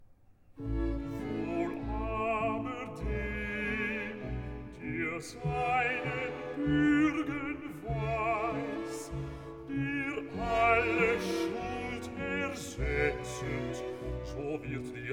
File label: Recitativo; Herr Gehe Nicht Ins Gericht, BWV 105; Kantate Trinitatis Plus 09